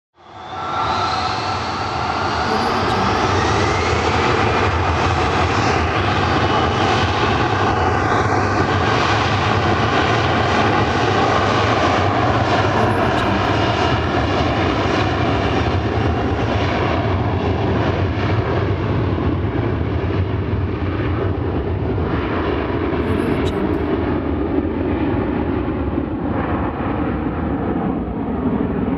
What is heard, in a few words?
airplane takeoff